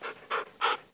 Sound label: Dog mov Start